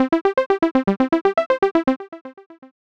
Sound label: bright plucky arp pattern 120bpm cmaj; 2 bars